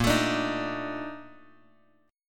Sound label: A sharp Major 11th, chord; A#M11 Chord {6 X 7 7 4 X} strummed